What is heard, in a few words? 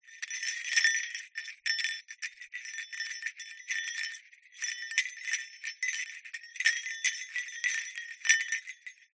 jester hat bells movement jingle; zapsplat; foley